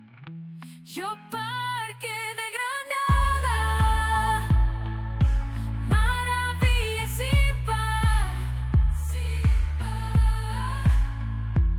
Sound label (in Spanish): Estribillo; Geoparque